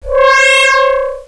Magic; mordor

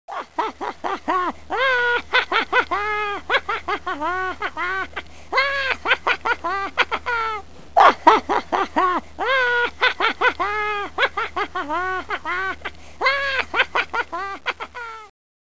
wolf laff; Greatest Stories

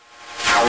teleport out; RobotRescue; music

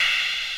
nav slide; ROBOTS

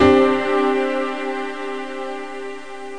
Pia string, sus2

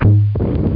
Gun Thunk; Blake Stone

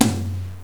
r tom

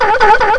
Sea Lion; Internet Pratique 01

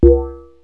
met drum, cartoon; Wired for Sound Pro CD; Aristosoft, Inc.

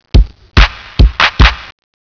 beat

